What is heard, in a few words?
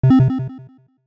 classic game action negative